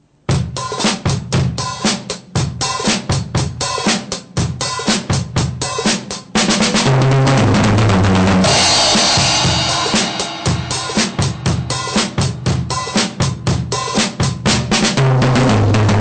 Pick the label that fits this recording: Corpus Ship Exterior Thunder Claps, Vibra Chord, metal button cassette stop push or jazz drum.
jazz drum